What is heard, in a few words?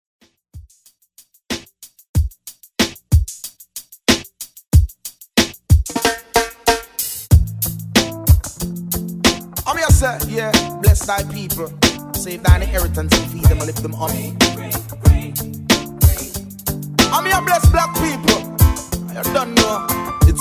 Reggae Redrum